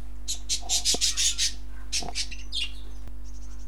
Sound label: Bird Chirp